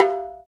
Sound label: Bongo, Roland BK-5; Percussion